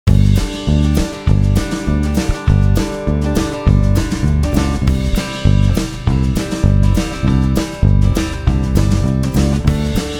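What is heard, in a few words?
Chord scales country backing track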